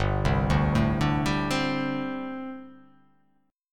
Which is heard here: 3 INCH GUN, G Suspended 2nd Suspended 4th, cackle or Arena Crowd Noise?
G Suspended 2nd Suspended 4th